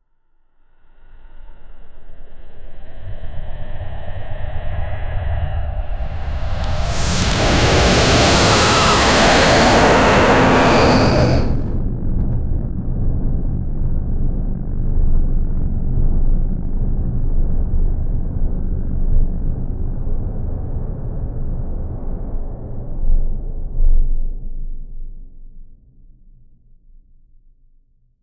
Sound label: the air slowly tightens, roar